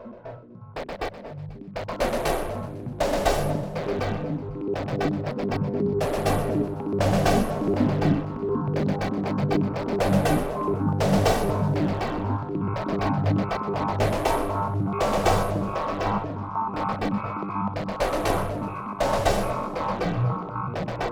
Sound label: Loader 120bpm